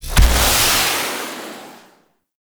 Archwing Blast Off; Archwing Deploy; Warframe; Cinematics; Rail Jack

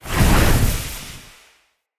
Lightning Slam Charge; Characters; Enemy; Abilities; Sinecure; Banneret

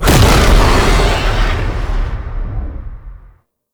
Brimstone Charge Start; Roathe; Warframe; Tau; Abilities

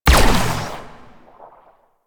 Amp Barrel Fire, Amplifiers; Warframe; Operators; Corp Amp Set One; Barrels

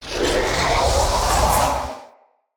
Casting Device Charge Heavy

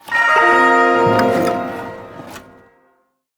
Ersatz Shawzin Unequip; Warframe; Duviri Ersatz Shawzin

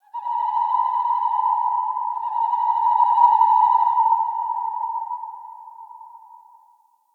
Signature Call; Ambience; Corrupted Ode One

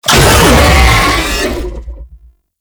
Infested Vocal Pain; Warframe

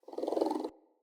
Puppy Interact Affection Closed Mouth; Enemies; Vocals; Kubrow

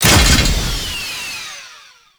Ghoul Devourer Rocket Pack Shutdown; Warframe; Grineer Ghouls

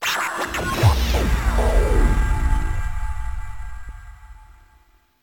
Music Game Over; Warframe; Caliber Chicks Minigame

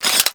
Blade Close; Infested; Mios; Weapons

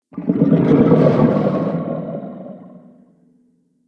Intestine Gurgle; Ambience; Bromius Inside

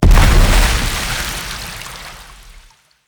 Claw Slam Impact Water; Attacks; Animals; Bromius

